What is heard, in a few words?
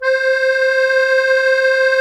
Musete, Accordians, Roland; Club-50 - Foundations